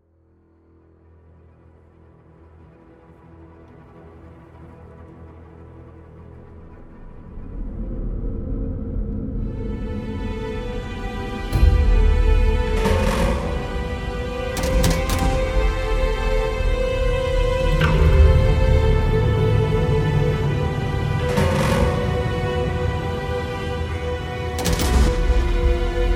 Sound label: Adagio Funebre low register